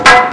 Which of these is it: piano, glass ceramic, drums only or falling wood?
falling wood